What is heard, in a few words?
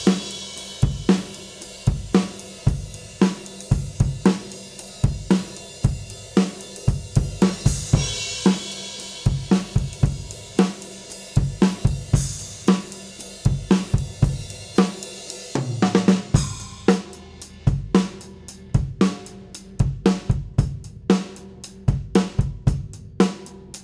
DRUMS WET; SONIMUS NYCOMP